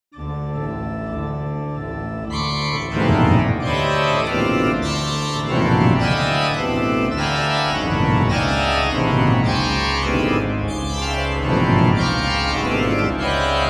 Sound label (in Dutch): improvisatie clusters; 11 jaar